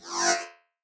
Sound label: Spacey Teleport Rip